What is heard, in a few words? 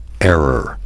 error